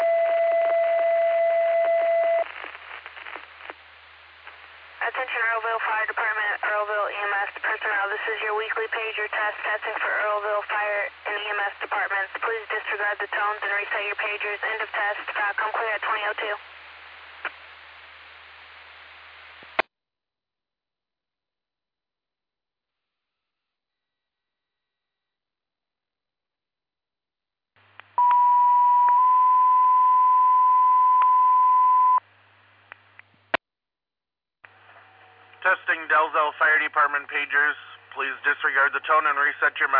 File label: Fire; Earlville; TTDAlerts